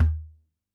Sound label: TABLA, PERC